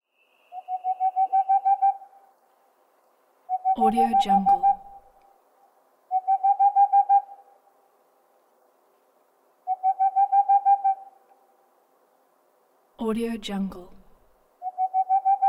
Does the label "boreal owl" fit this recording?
Yes